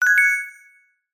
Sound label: coin